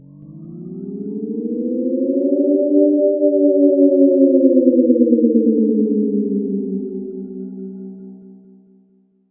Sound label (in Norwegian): luftvern